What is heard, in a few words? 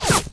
bullet whiz